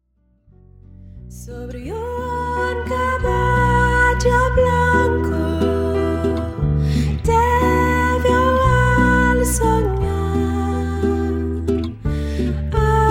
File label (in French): Soprano voix douce